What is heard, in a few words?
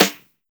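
CLAP SNARE, JUNGLE KIT; Drums In Your FACE!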